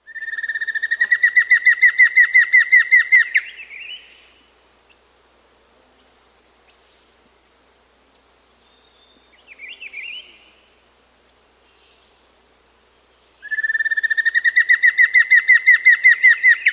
Giant Antshrike; S. America

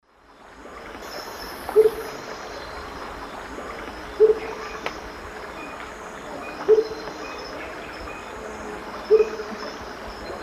Baryphthengus martii, hoot song; S. America; Eastern Lowlands

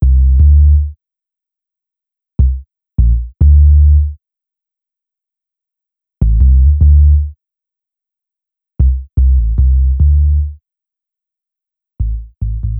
Bass Hip-Hop

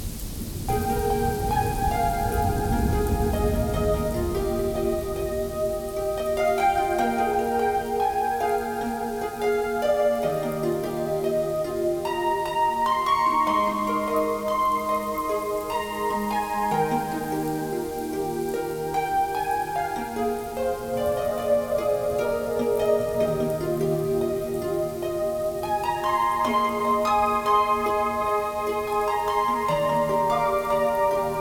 Welcome Rain; The Sounds Of Nature

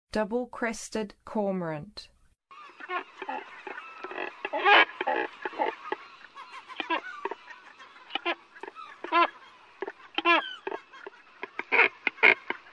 Double-crested Cormorant; North America

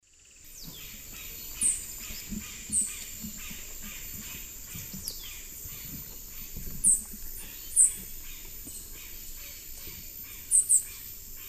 Dacnis flaviventer, calls; S. America; Eastern Lowlands

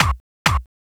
DESIGNER DANCE TOOLS

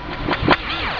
RAY GUN

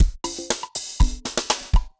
dancefloor perc 120 bpm

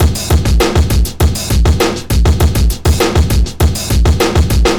DOWNTEMPO BEATS

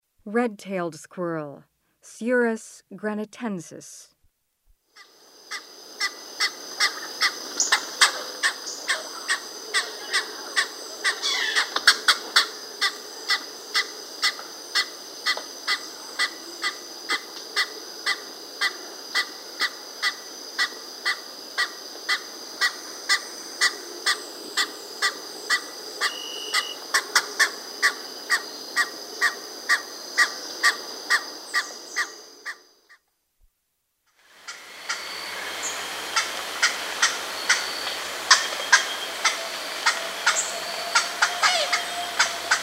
Red-tailed squirrel; S. America

